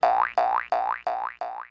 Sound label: vine; The Interactive Alphabet